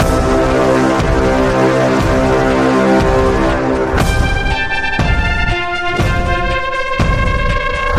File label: donation drum